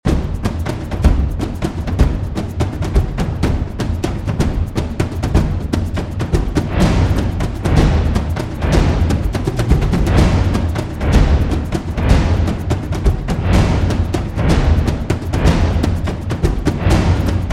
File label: Hybrid Drums; Abbey Road